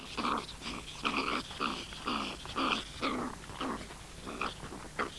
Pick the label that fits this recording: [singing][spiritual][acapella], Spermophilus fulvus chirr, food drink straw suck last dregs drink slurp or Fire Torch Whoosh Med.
Spermophilus fulvus chirr